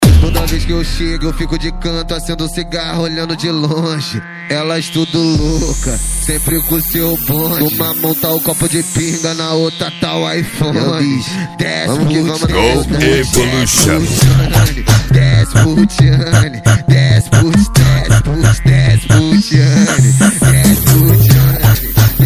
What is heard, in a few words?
funk tumdumdum funk bass